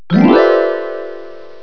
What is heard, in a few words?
KLINGUP